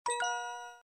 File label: click